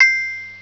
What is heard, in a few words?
CHIME